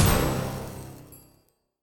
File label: win Line End Flash Small Win; jumanji